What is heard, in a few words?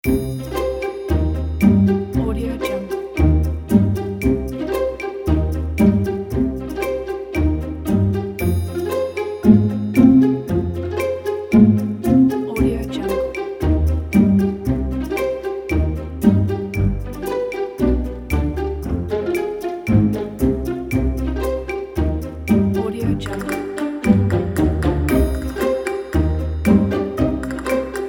funny comedy pizzicato